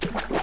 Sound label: splash; zsdx